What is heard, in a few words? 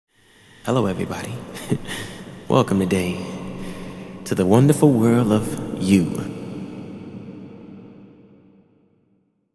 free Vocal Acapella; 128.0 BPM